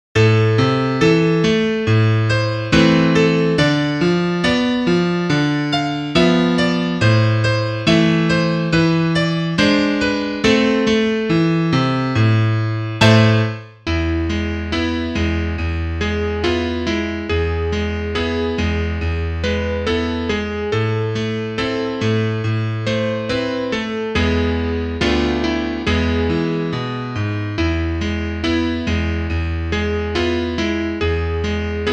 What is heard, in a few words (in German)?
Klavier Solo, trompete; Havah nagila